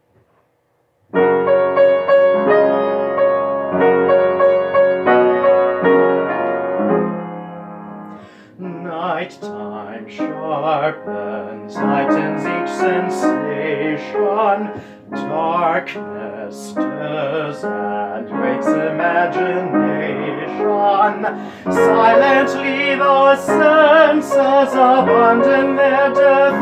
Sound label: Night Music